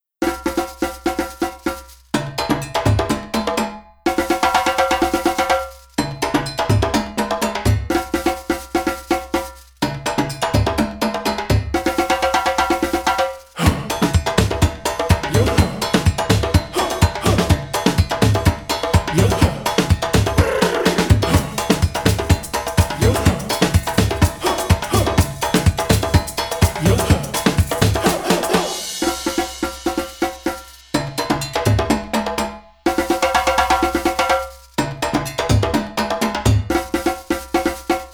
Drum Dance Instrumental

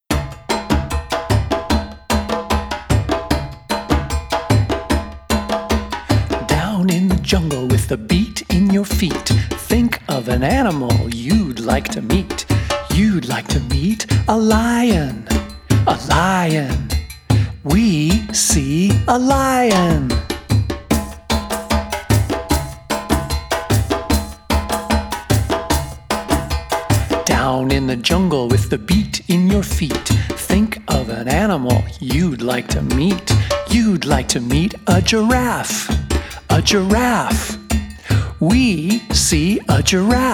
Jungle Chant

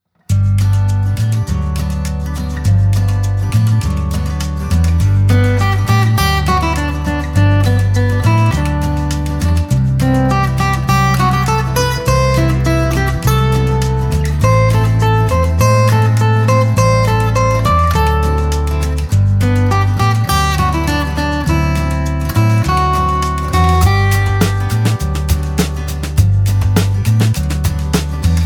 Joey Jig Instrumental

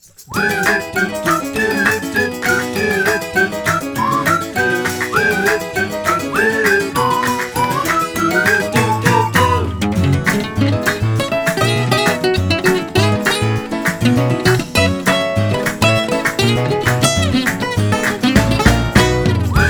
Happy Dance Instrumental

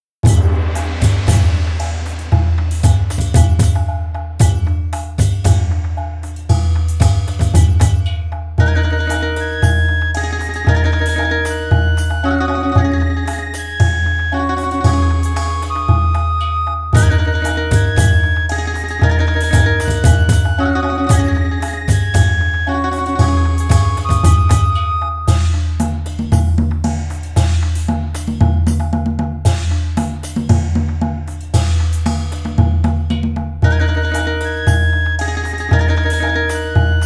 Chinese Ribbon Dance Instrumental

